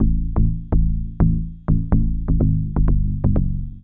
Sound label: SNTH BASS HOUSE 125 A; eJay